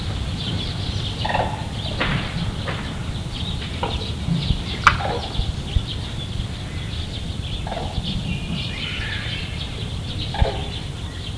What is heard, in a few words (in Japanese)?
aneha zuru, animal sounds; アネハヅル; 鳥類; 全長約90センチ。中央アジアと北アフリカの草原で繁殖し、冬になると東アフリカ、中近東、南アジアにわたります。